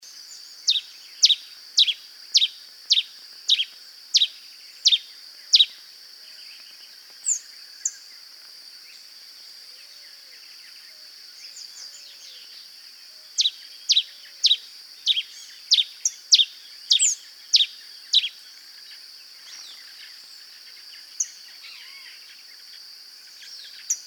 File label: pileated finch